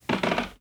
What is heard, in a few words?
walk wood creaky